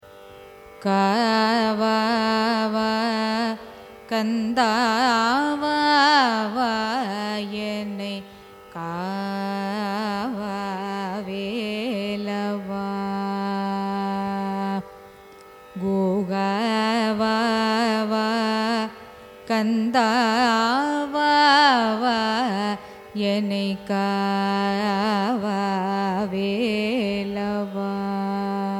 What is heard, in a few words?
raga varali carnatic; carnatic music